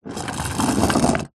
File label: toy train wooden roll wood floor short